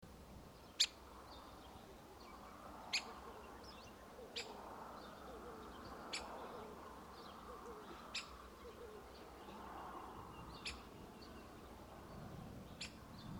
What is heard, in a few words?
white winged woodpecker